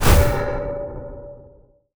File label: impact player